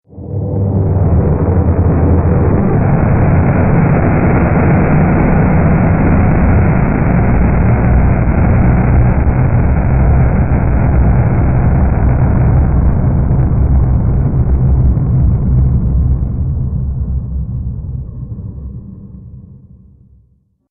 Rocket Engine Powerful Sound

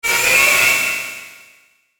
Ascend Swell Filter Metallic Reverb; Blastwave

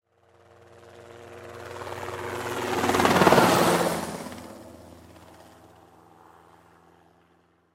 Helicopter Fly By; Transportation Aircraft; Helicopters; Vehicles

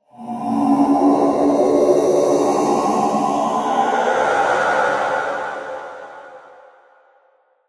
Sci-fi Monster Laser Lizard, alien; From B Movies To Blockbusters

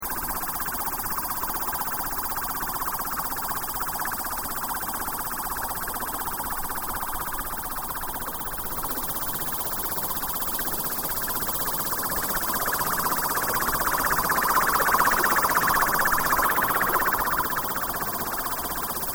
SCI FI VEHICLE HOVER LOOP; Various Sci Fi vehicle effects; Spacecrafts; SoundEffects-Foundation4

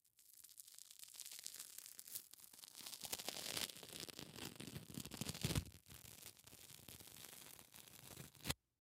Bandage Peel Off, Bandage Peel Off Skin Rip; First Aid; Hospital; Emergency

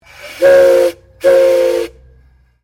Train Steam Engine Whistle Double

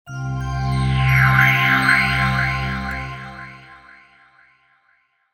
Startup, Computer: Short Musical Style Phrase; Miscellaneous Musical; Stuart Duffield

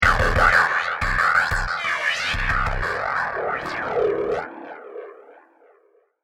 Future Warfare, science fiction sound effect; Science Fiction Sound Effects

